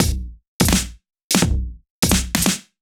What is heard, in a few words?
Kit Heavy Swing, 85bpm; off the grid